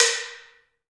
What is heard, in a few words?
AMB SLIT, LOG DRUMS; Heart of Africa